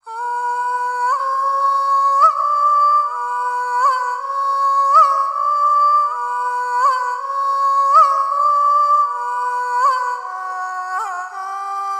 Ethnic Trap 80 choir Bmin

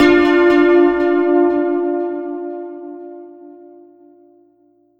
Synth Stab 120bpm Dm; Zenhiser

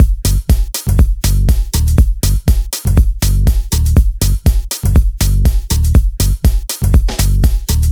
Drum, 121bpm

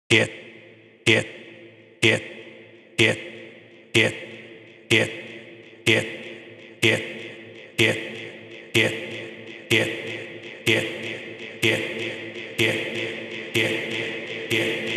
Vocal Loop Get 125 Am

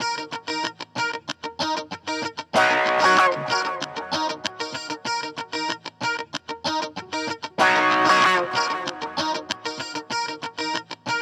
guitar stack Eb min; LESrain

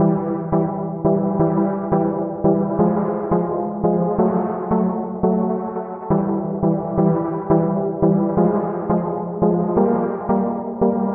Synth Distant 172 Em

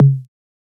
Tom 90s Hit

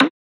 Percussion

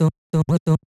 EVIL 90BPM Fm

